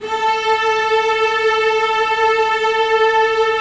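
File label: celli sustain a3; Sonatina Symphonic Orchestra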